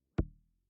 Hit Wood

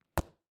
Kicking The Ball

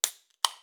Soda Can Opening

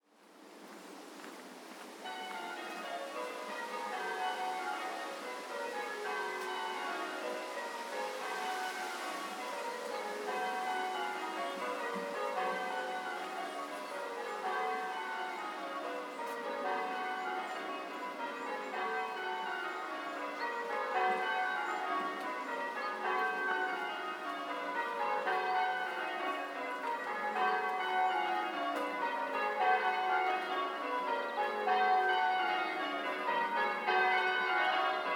Church Bells Approaching

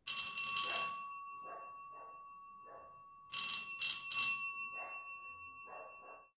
Door Bell With Dog

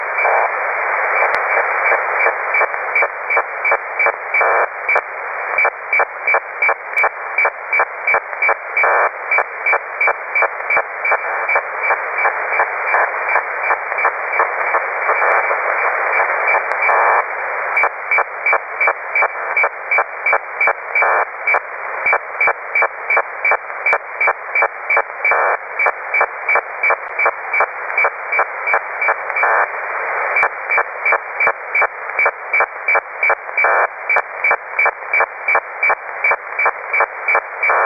Radio

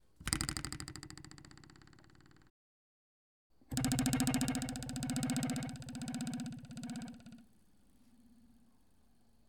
Diving Board Cartoony Slow Bounce Fast Bounce

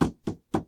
Wood Pat

